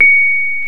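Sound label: piano Rhodes Mk.V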